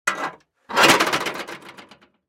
Bouncing Metal Rattle Scrap Debris; Mechanical Wave; Undoing-Computer

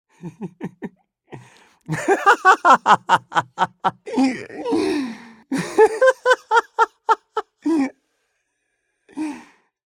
Joyful male laughter; Laughs

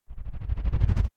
Quick Modulating Flutter

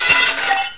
metal, debris

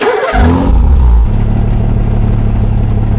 atv start loop, vehicles; hl2

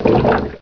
swim, player; hl2

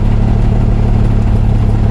atv idle loop, vehicles; hl2

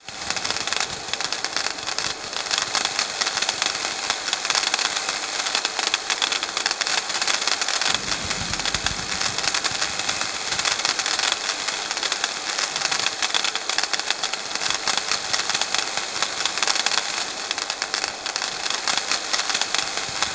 electric crackling, Industrial Ambiences and SFX; BlueZone; Dark Mesa

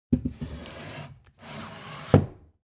shelve Open Close Soft; Everyday Life 01

